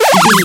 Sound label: retro powerup item